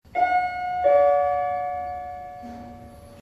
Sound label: elevator; dandy